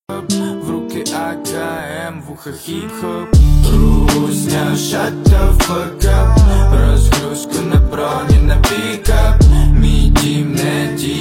hip hop

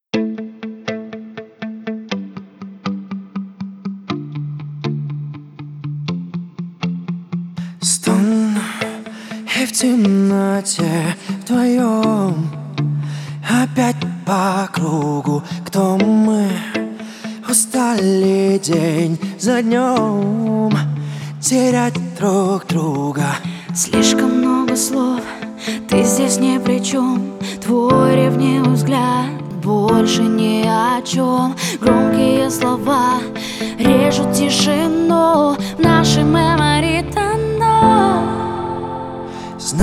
Acoustic Live

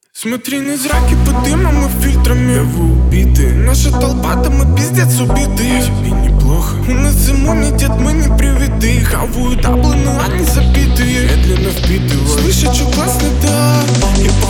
tehno

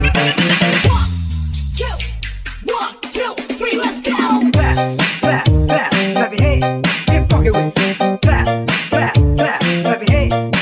BREAKBEAT

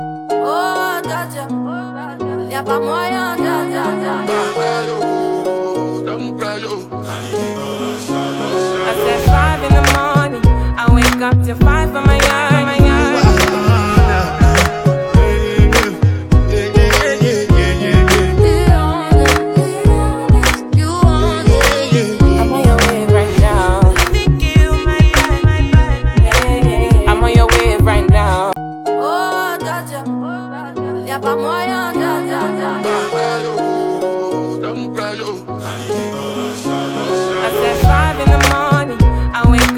Afrobeat

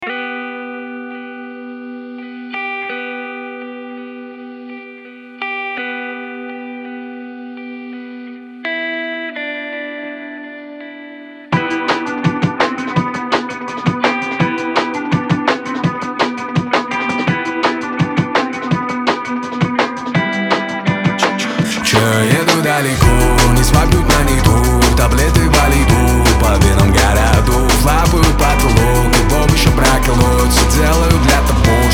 LOFI

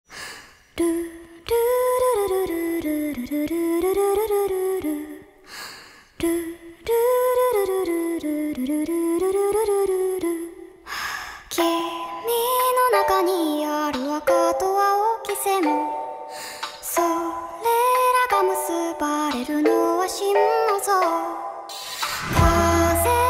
Nightcore; Chill Box; Suzume no Tojimari

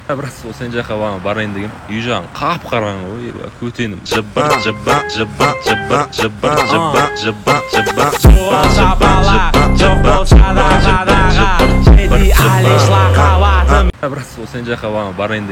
phonk

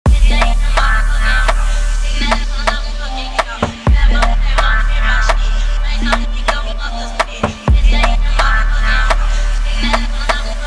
Brazilian Phonk Mix

